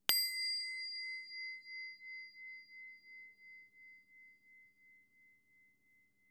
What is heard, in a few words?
small bowl ext sound